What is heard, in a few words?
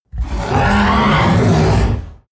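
coloss shout angry deep, creatures; kreaturen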